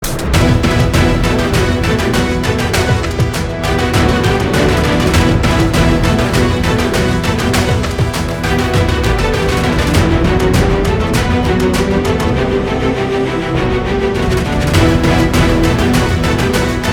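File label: Interval Fight background music Ringtone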